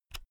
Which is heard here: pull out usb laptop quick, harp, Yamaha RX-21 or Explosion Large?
pull out usb laptop quick